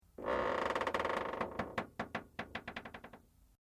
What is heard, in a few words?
METAL CREAK LIGHT; Materials